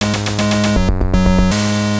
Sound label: victory; SpaceForce